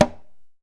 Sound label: conga; Zero G - Man Machine